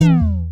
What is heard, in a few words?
error mistake sound effect incorrect answer